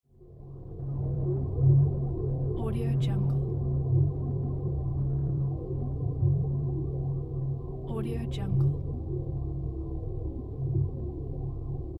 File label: drone ambiance